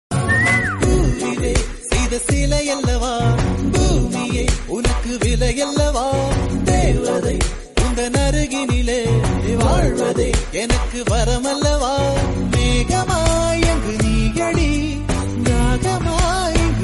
tamil song

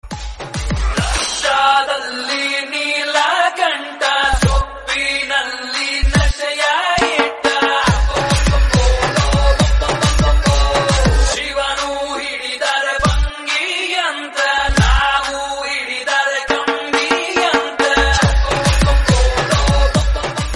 tamil bgm; sivan

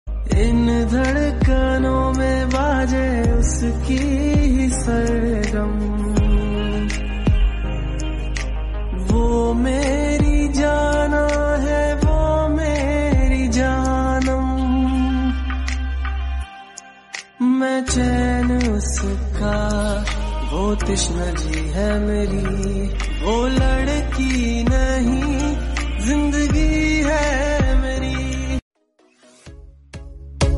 romantic hindi songs

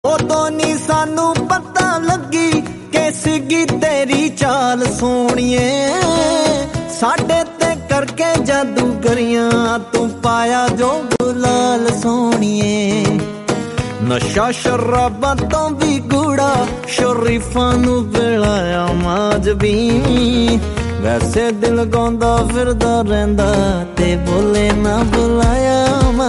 romantic, punjabi

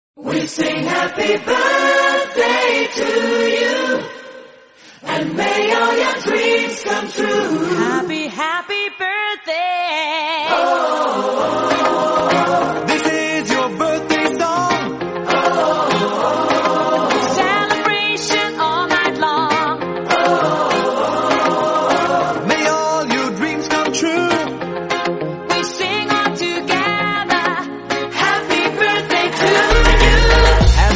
happy birthday my best friend birthday wishes

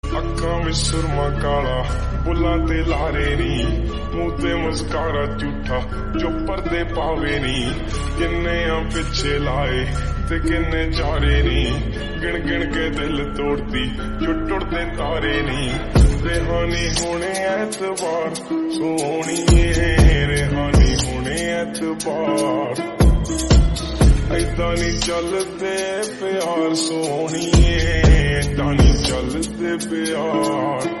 slowed reverb punjabi song

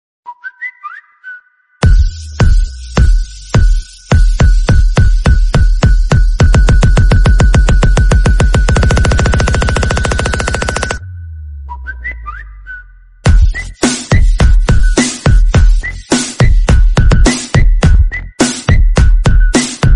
phone remix samsung remix samsung galaxy

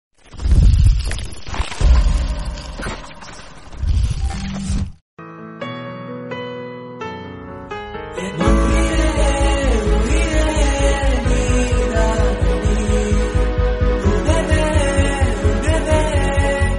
bgm tamil

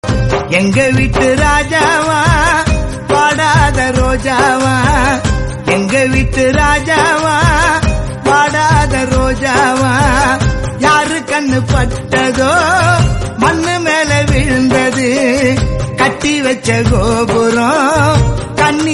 chennai song

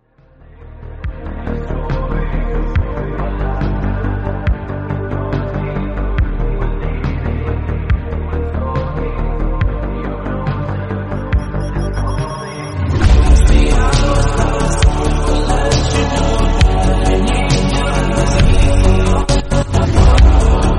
remix english

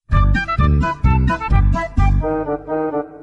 theme music ending

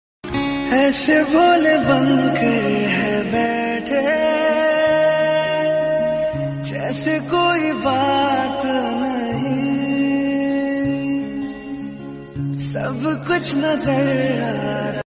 romantic song

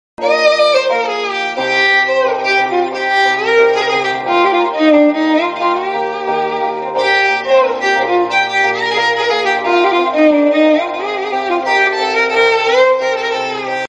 violin instrumental romantic

